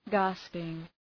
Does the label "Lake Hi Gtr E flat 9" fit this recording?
No